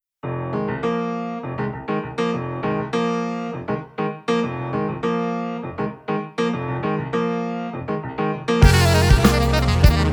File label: Jazz Fusion